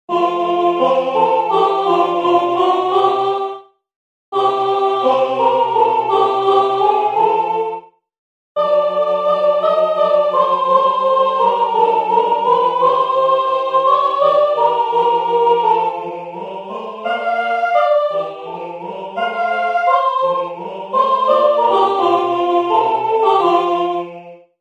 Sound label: Ecco quel fiero istante sop; Mozart; Nocturnes